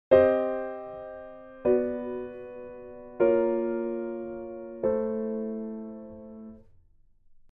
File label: parallel minor chrom, piano